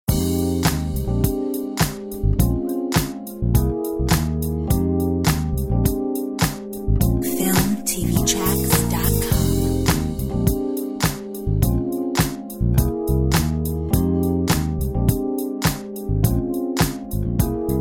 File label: Jazz